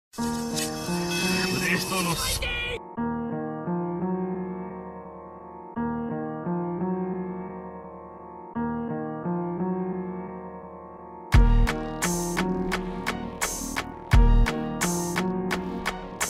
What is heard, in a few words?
Instrumental